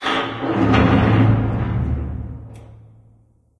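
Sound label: metal randoms impact, ambience; source engine; portal2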